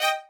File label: violin